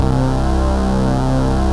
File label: HOT FUZZ, TECHNO; Pro-Rec - Synthsonic; Z1 TECHNO